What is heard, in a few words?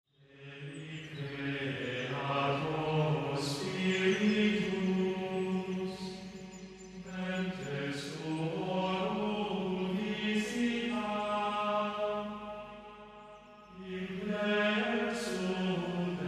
Gregorian Devotion